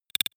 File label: noise click metal; maschinen; signale; soundpool